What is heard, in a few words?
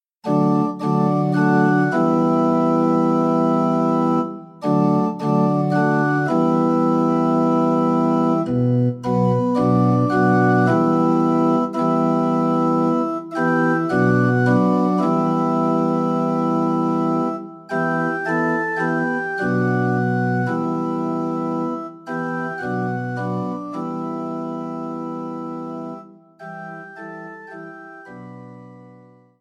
accompaniment